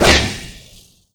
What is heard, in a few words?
stalker slice